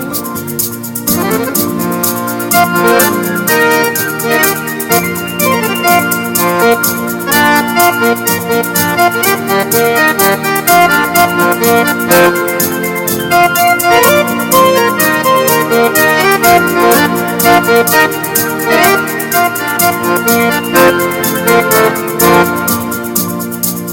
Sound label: Very Pretty Sounds